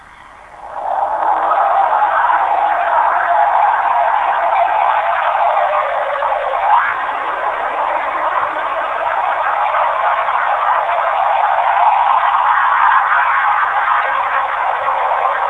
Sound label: combat; skyhork